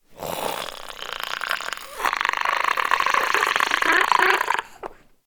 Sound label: idle, zombie; DayZ-Epoch; dayz sfx